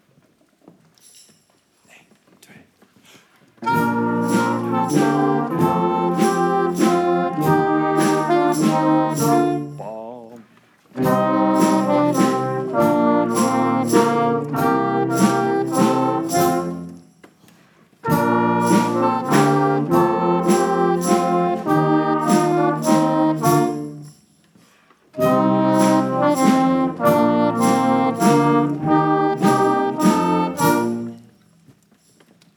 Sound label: Waltz; Introductieles deel 2